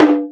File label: Cango, Percs; Tech